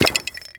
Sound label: Magic Harvest Orb; Levels Hub Swamp 03 Cursor Harvest; Orb Release